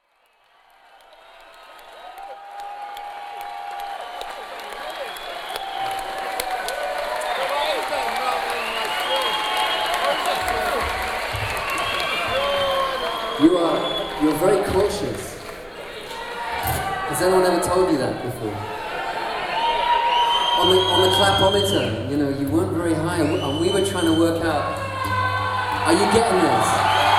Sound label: audience